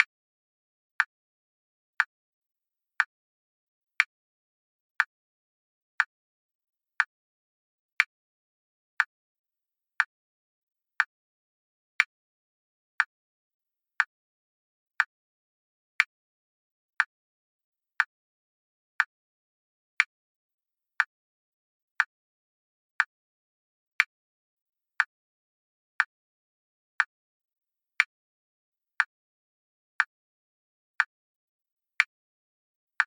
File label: Winter clicks